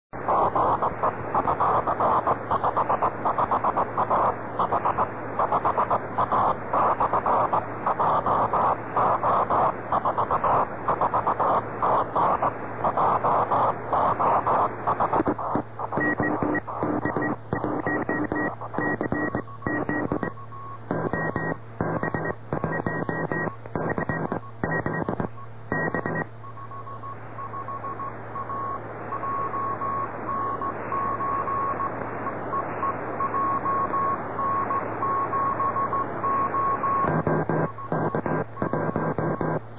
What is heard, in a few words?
qrm